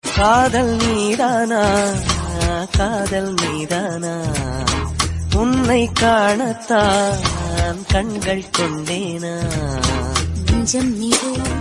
Male Version - Tamil Ringtone; ringtones

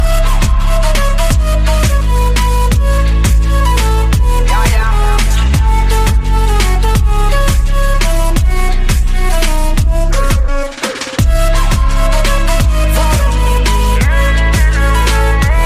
English Instrumental Ringtone; ringtones

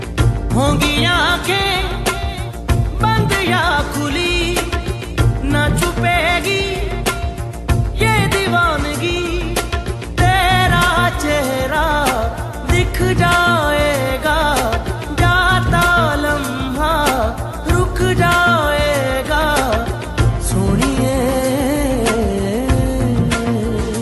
Breakup Song Ringtone

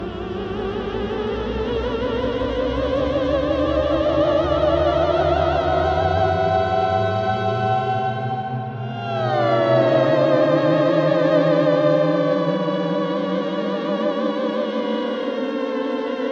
Scary Music Sounds Ringtone; ringtones

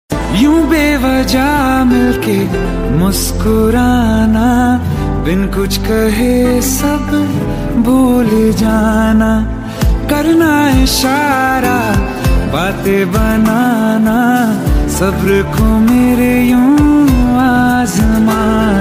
Romantic Hindi Song

